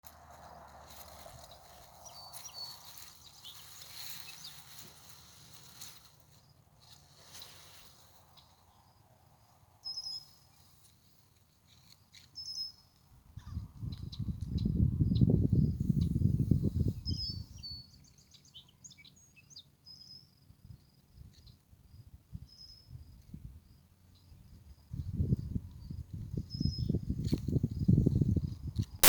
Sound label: kits explore, coyotes